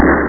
SHIP FIRE; starbase

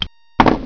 K or Q falling, chess; Outland; outland-chess

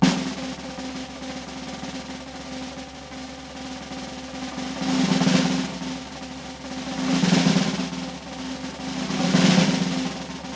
roll

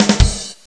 Rimshot; MacStarDigest7

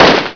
usp; SpecOps2 MPlayer Demo

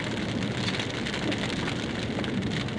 Fire Crackle; urbanchaos

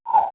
slider

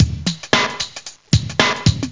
breakbeat; World of Sound; instruments; beats

